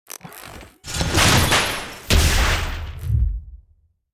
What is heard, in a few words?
Frost Chain; starbites